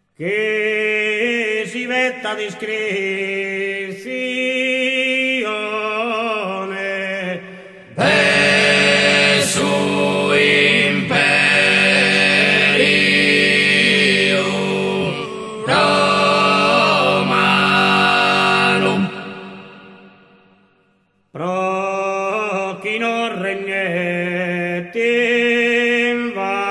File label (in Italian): Tenores